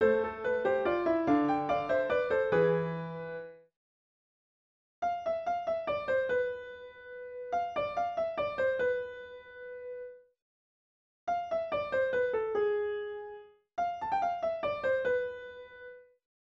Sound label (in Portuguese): Sonata para flauta em Mi bemol Maior; Bach